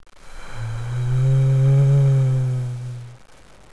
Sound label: controller idle; svencoop; schall